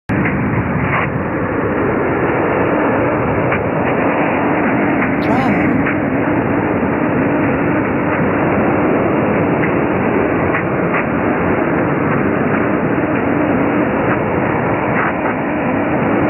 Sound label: noise; vof